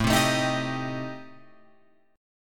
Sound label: A-Minor 7th Flat 5th, Guitar Open F, chord; strummed; JGuitar